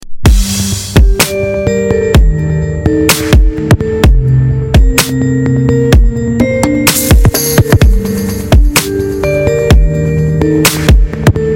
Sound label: Miss You Chillout